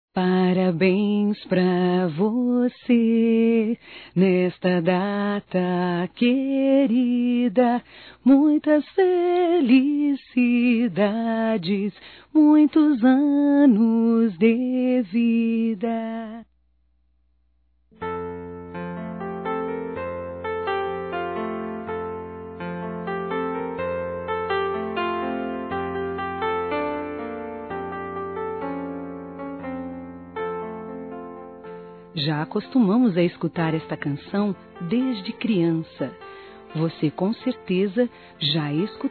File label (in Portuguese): CANTANDO PARABÉNS (F); Aniversários; Aniversários diversos; fem